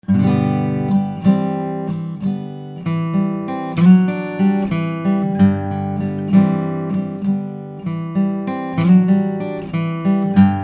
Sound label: Martin pick